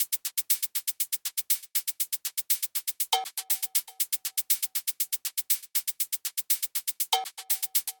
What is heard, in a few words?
122 BPM Shakes; percussion essentials 1